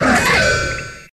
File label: Cry; infinitefusion